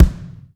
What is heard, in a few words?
AMB K, HALL KITS; Crypton - DrumBase5000